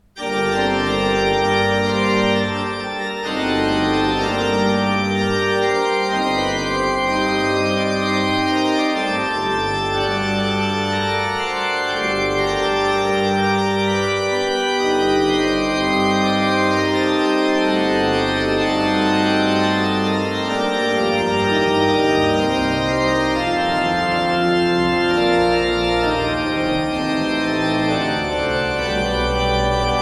Breil, gebrauchtorgel

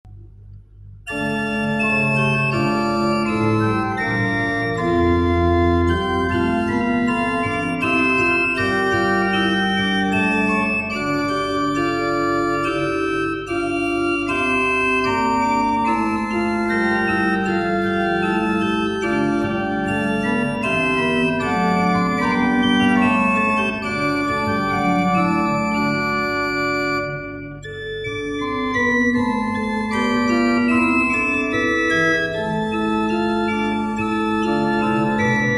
Becker 3 I P, pfeifenorgeln

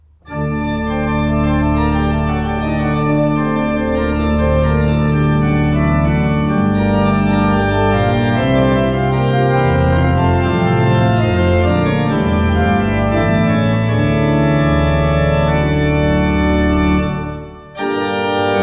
Beckerath, gebrauchtorgel

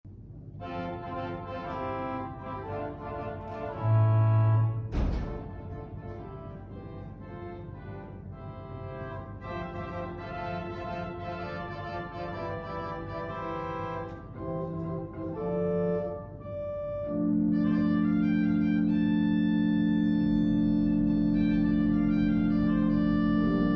Orgel, pfeifenorgeln; Benson

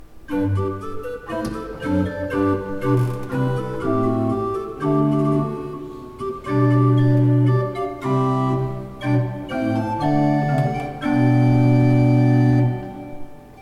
Peter, gebrauchtorgel

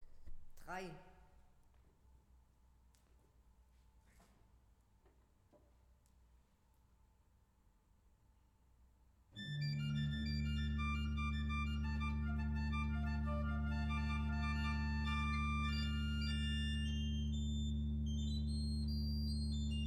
Weigle, gebrauchtorgel

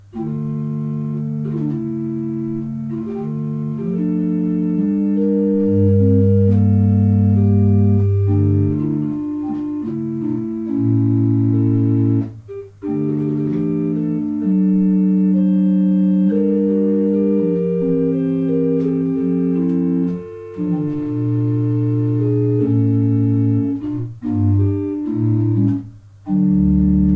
Verschueren, gebrauchtorgel

